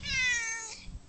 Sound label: meow; space kitty